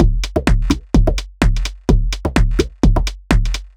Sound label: 127bpm, House Drumloops